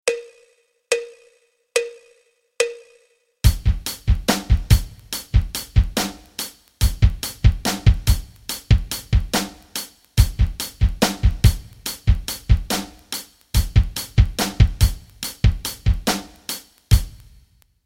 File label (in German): rock rhythmen